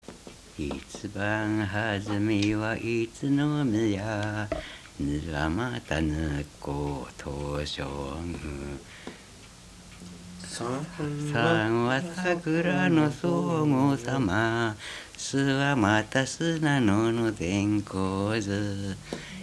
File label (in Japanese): itibanhajime, minyou; 一番はじめは; わらべ歌; 白井市; 白井町